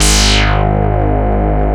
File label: OSCAR G#1, synthesizer; Hollywood Edge - Giorgio Moroder Rare Synthesizer Collection